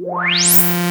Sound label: SWP ANALOG PAD; SYNTH FX